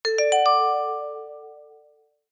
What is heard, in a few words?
new notification; universfield